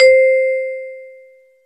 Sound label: Vibe